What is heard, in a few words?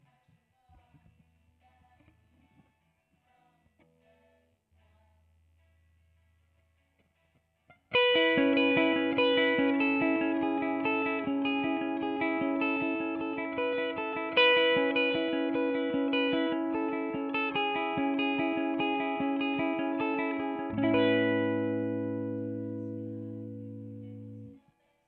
guitar track pick